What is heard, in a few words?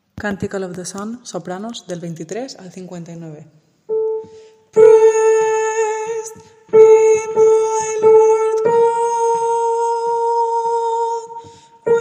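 soprano; The Canticle of the Sun, Amy Marcy Beach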